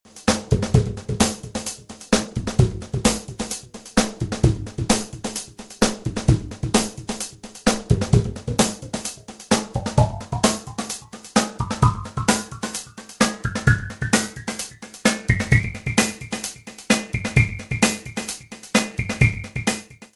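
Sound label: Drumloop Frequency Sweep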